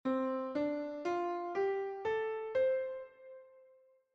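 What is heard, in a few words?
Penta, Pentatonic scales, bells; Penta 1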